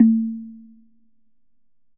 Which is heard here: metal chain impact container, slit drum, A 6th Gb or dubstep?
slit drum